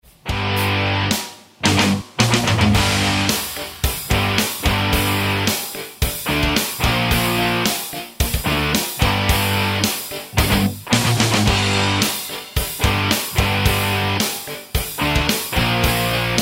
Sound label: metal